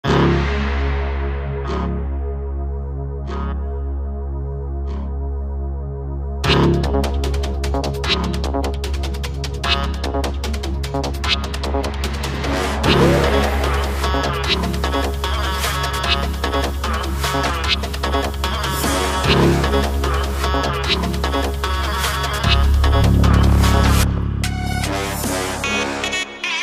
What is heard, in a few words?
glock, beats